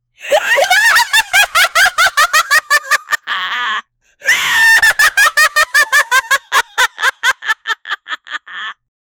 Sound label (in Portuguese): bruxa rindo, witch